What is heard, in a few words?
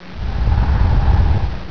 WIND